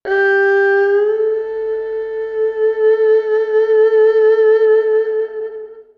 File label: erhu